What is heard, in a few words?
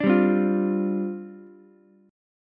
G 7th Suspended 4th G down Ukulele; G7sus4 strummed